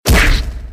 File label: hurt; aerfa quan